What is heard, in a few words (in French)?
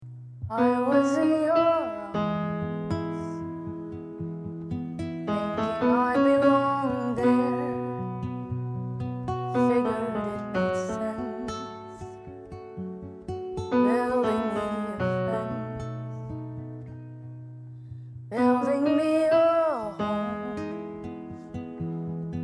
voix 3 guide vocal